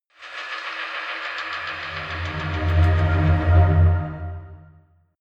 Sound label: flutter, drones